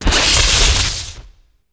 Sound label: electric